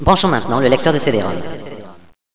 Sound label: kit; Villagina; Village; ECOLE